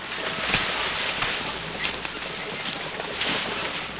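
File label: Artillery Run Loop Forest; Civ4 warlords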